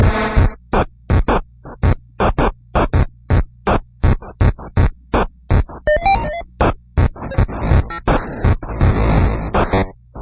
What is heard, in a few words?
goofin